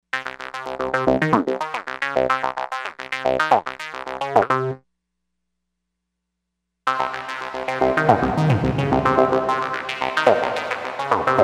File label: GATED REVERB